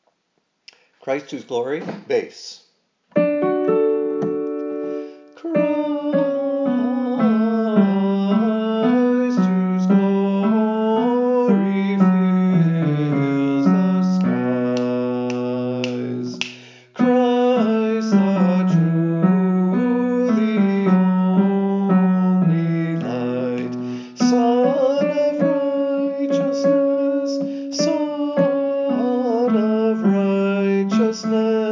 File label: Christ Whose Glory Bass